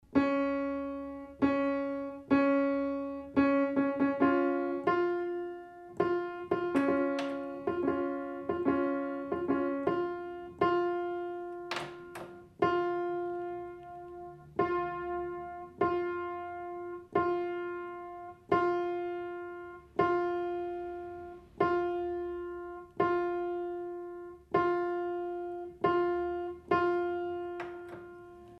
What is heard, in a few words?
music